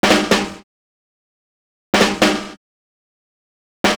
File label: FILL, loop